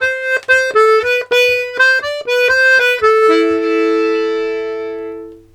Accordions, 120 laride

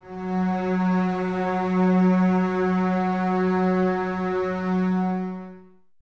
BSS F#3, String Ensembles, Basses, f Full; Miroslav Vitous; 90 s Sample CDs

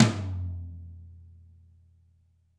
GRETSCH TOM; ILIO; Double Platinum Drums 1

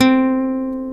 Takamine C3; Mo' Phatt; Digital Sound Factory Mo' Phatt

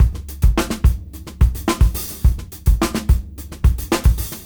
Junky Funk 107 BPM; AMG - Keith LeBlanc Phat Butt Jams

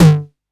909 TOMS; Electronic; Wizoo - Platinum 24 Electronic

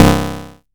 bass stabs, Drum Machines FutureRetro FR777

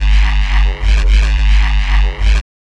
Bass loops; neuro; Optiv & BTK

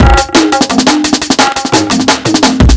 E Beat, Electronic Beats; Drum n Bass; Loops; Beats and Percussion; KJ Sawka; Drum n Bass & Beyond

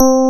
BRIGHT PF C3, Piano, ELECTRIC; ELECTRIC PF#3

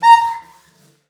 CELLO FINGER; 90 s Sample CDs; Xsample VOL-5

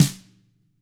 HIT, TAMA SNRD, Drums; ILIO; Double Platinum Drums 2

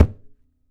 MADAL SLAP, DRUMS OF IND; AKAI S6000 CD-ROM; WorldSounds

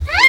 Guitar Slide Rev; Zero-G Jungle Warfare 1, 2 & 3

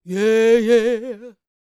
D YEAH, Vocal R&B Dance; DB DANCE

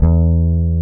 BASS E2, Electric Basses; PlatinumPhattCD2; E-MU Producer Series Vol. 8 – Platinum Phatt

